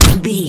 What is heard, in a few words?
gun silenced pistol shot